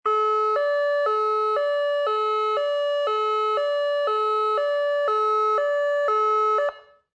Emergency Medical Ambulance Siren Alternating Beeps; Emergency Vehicle Sound Effects